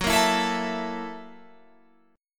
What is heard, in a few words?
Gb 7th Sharp 9th Gb, Guitar Open F; Listen to Gb7#9 strummed